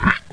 hiccup; Total Chaos Nukem 3d Pack; duke

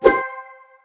holy Water throw; vampire demo

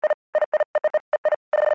beep; zones; industrial

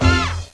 Horn Hit; Jim Heid’s Sounds

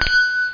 buz; Macromedia - Multimedia Showcase 5.1

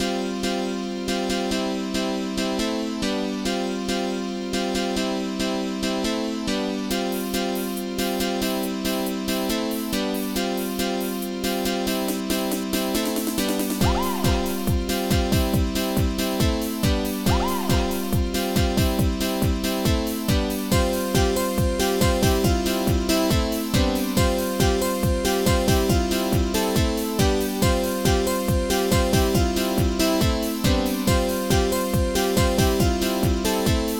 jingle; Scene 96 International Edition; Zyklop Software; turnevil

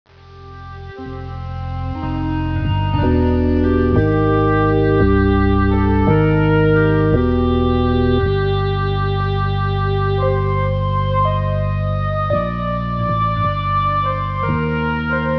music

